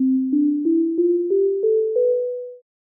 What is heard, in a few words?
unfinished scale